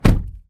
Car Door Slam

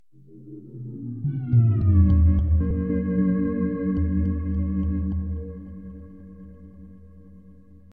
Fade Into Spookiness

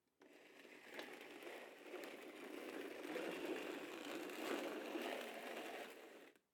Moving Chair

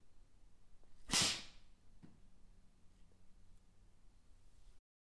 Blow Dart; Trevor Webb

